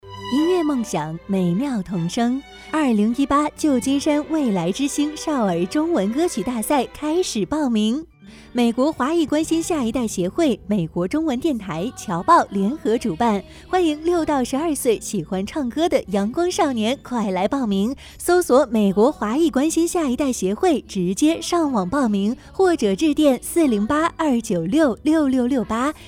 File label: singing contest; chineseradio